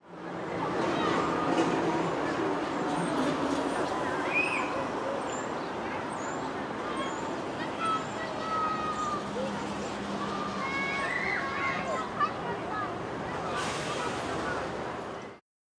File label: Molokai Town